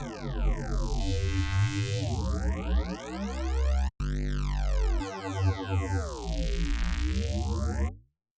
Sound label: The processed synth; 在该轨加载Phasis效果器，将Notches调至12，Feedback提升至70。; 通过增加全通滤波器数量和共振强度，其为声音带来了丰富的质感变化。; 由于我们没有动Stereo旋钮，因此该轨仍为单声道。